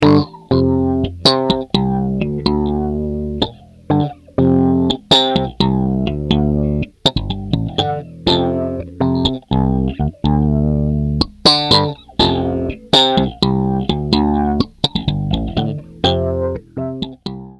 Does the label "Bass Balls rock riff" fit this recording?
Yes